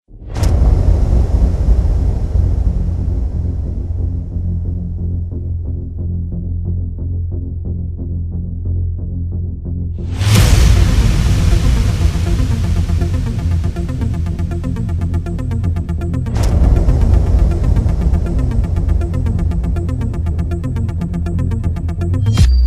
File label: Trailer Music